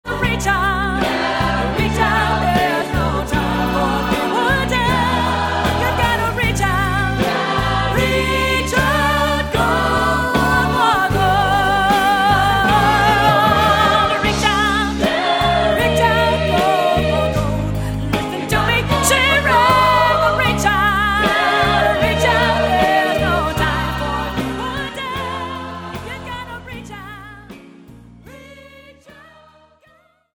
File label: finale chorus